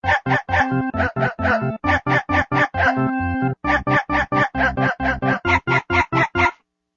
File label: bgm